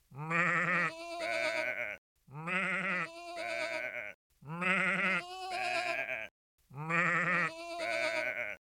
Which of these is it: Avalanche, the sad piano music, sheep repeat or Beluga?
sheep repeat